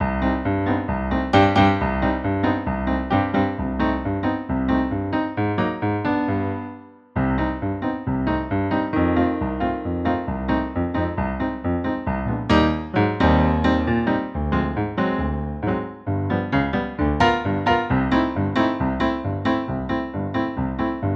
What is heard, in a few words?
audition Accomp